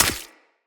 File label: Fey Flock Attack Hit; Powers